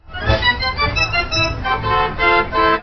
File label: pipes; MELODIC SAMPLES; buster keaton escapes